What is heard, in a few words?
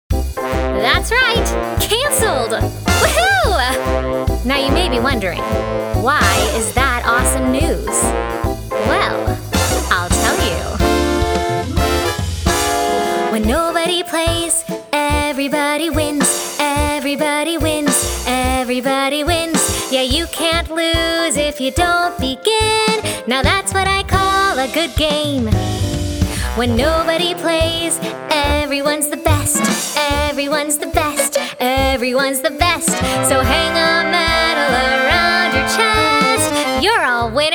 VOCAL; The role requires the ability to sing a comedic song with lots of energy and positivity!